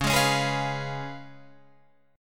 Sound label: C sharp 7th C sharp, Guitar Drop D